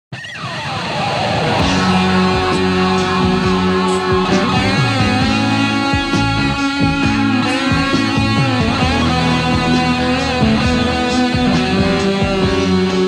Solo Big Muff; SSL5

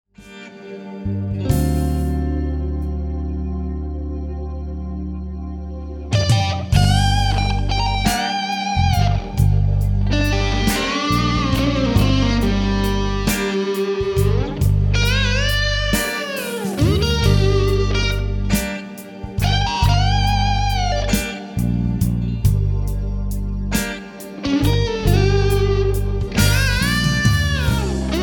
Solo Short Delay EMG; Earth Drive

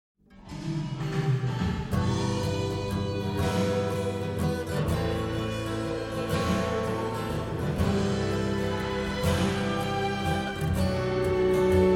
Solo Reverb Room Mix

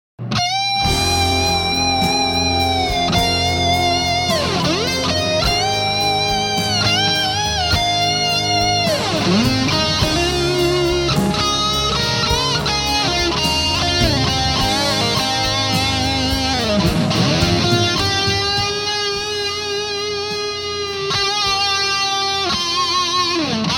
solo EMG Strat

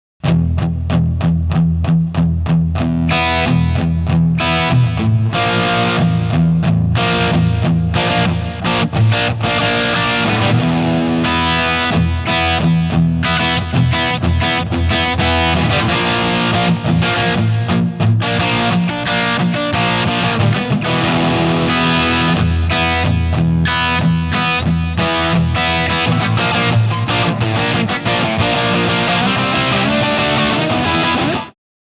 intro Cornish G-2 Hiwatt Eclipse cab FF delay

